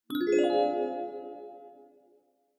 Multi Accent Low

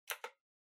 PC Keyboard Far Enter Mid

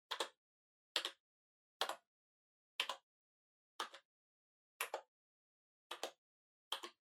PC Keyboard Far Single Gentle

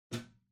Wall Outlet Far Wall Unplug Hard

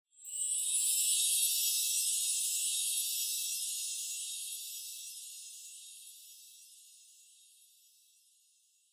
Onoma Sparkle Long

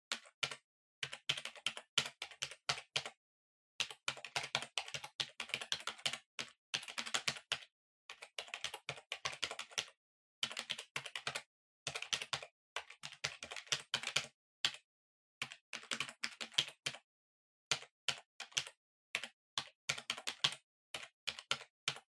PC Keyboard Far Mid

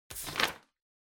Flyer Take

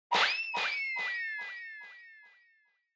Motion Blow Away Mid Delay

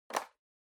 Portable CD Controller Plug In

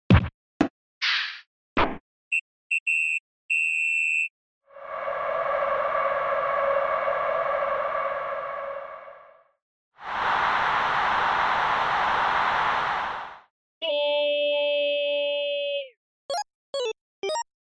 SNES Soccer

